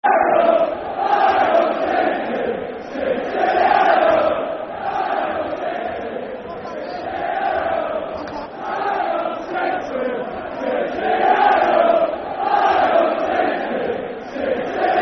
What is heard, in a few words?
Aye Oh City Football Chant (Soccer Song); Bradford City Bcfc